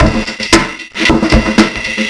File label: House Bangin, Synchro Funk, Beats; Sonic Foundry (Sony Creative Software)